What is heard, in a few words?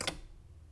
Inserting Capsule Into Coffee Machine